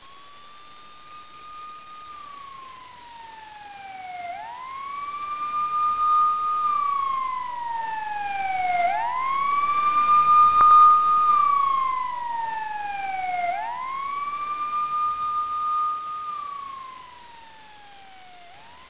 siren

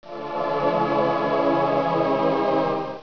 choir; cathedral

